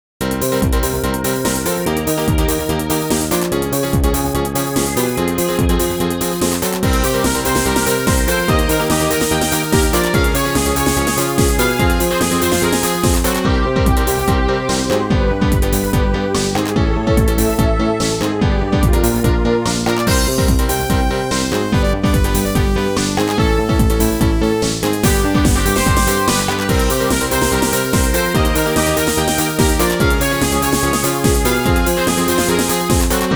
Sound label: RPG battle